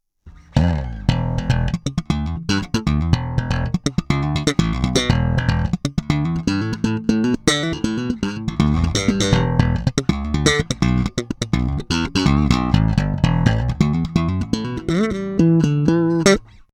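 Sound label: JB-75 SB Vintage Series Slap, bass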